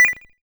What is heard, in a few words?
system menu select move